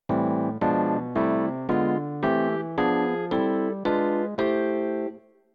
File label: block chord, piano